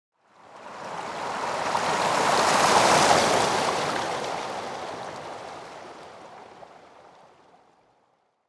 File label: Waves